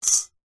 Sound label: office ruler metal set down concrete; zapsplat